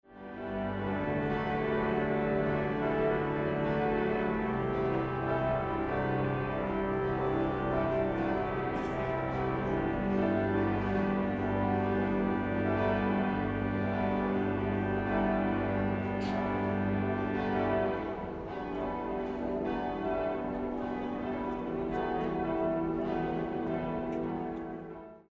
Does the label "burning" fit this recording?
No